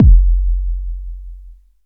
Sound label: warm kick drum sample F sharp key; kick drum samples